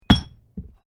Weights Dumbell Plates Put Down, Gym weight lifting equipment; Sports